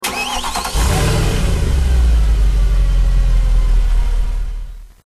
engine start, vehicles cars; mere63; tdmcars

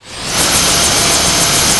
jet whine; wac

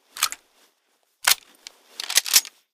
rifle sniper reload

